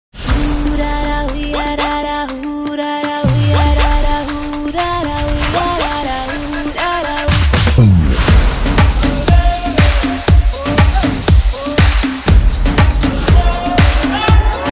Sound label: Instrumental Ringtone